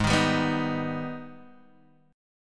G sharp Minor Major 7th G sharp down Guitar Half Step Down